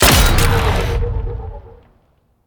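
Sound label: nailgun, impact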